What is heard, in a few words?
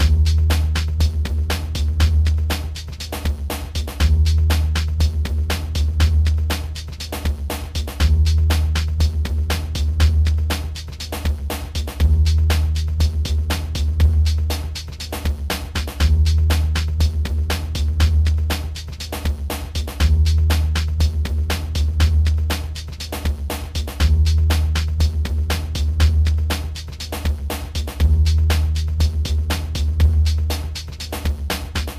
fuzz